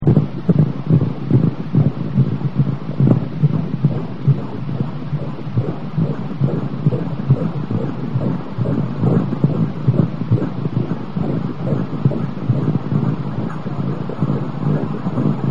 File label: doppler